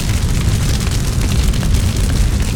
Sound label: skill fire loop; agar agar